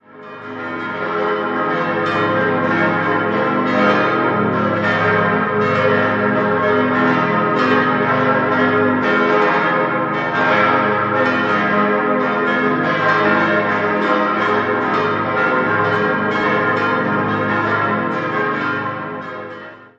Bischofszell, Glocken, Pfarrkirche; Bischofszell TG; St. Pelagius; 6-stimmiges Geläut: as°-c'-es'-f'-as'-b'; 1967; in Rickenbach gegossen; Emil Eschmann

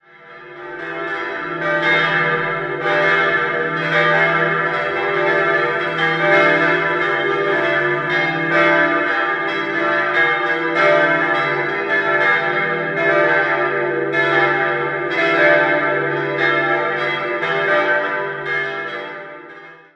Freising Lantpert, Pfarrkirche, Glocken; Freising-Lerchenfeld; St. Lantpert; 5-stimmiges Geläute: d'-e'-g'-h'-d''; im Jahr 1984; in Passau gegossen; Rudolf Perner

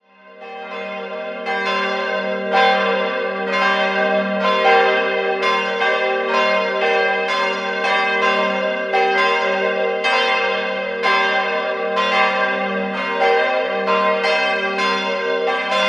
Otting, glocken

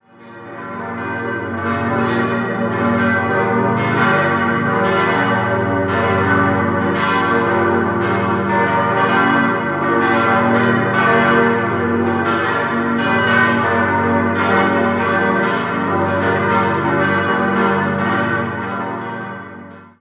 Kreuzlingen, Basilika, Glocken; Basilika St. Ulrich und Afra; G-Moll-Geläute: g°-b°-d'-f'-g'-b'; Kreuzlingen TG; Emil Eschmann in Rickenbach TG gegossen

